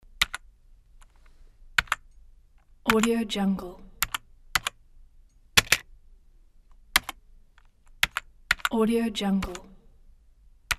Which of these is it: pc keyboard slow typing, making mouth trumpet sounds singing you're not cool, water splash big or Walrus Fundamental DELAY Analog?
pc keyboard slow typing